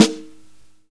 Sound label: Snare Live, Drum and Bass